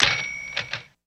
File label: Old Typewriter Bell; typewriters